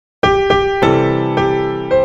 生日快乐 钢琴伴奏曲

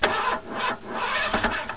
START, 汽车

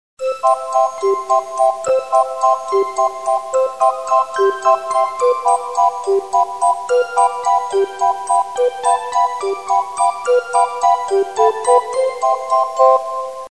音乐铃声; 钟声; 广播室铃声系统

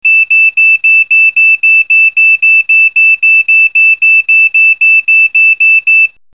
警报音; 告警; 广播室铃声系统

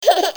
娃娃

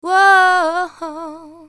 女生唱歌

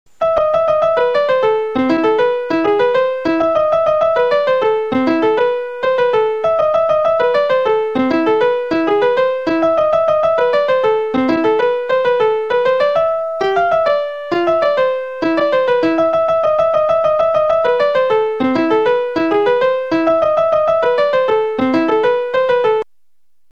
音乐铃声

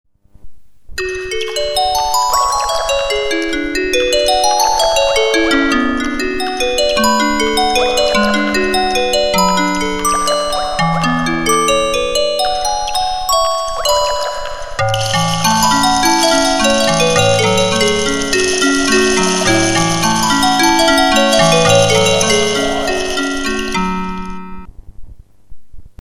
中午起床铃声; 小学; 广播室铃声系统

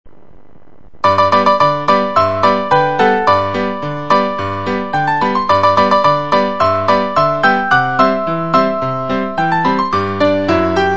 集合铃; 小学; 广播室铃声系统

